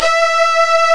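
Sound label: ensemble str, ensemble strings; Star Trek demo; Star Trek Audio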